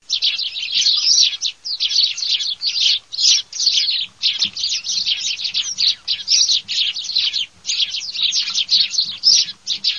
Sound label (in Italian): passeri